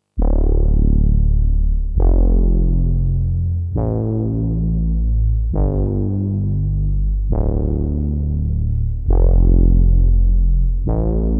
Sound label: Filter Bass Pad